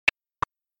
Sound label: tictoc shorter